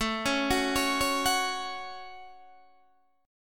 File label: A 6th, Guitar, DADGAD